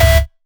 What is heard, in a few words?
Synths Root C